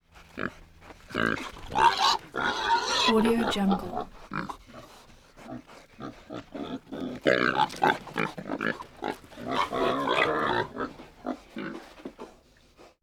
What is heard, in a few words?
pigs beastlike screams